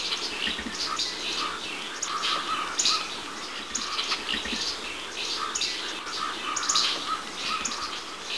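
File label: bird chirp; EnvSound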